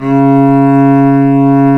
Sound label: cello, Arco Solo; String Master Series